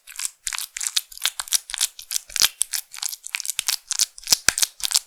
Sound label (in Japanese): 奥まで差し込んだままパンパン ループ, ASMR; ピストン運動; 激しめ